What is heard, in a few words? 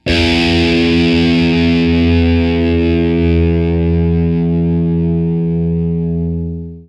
Electric VS40V Single Note short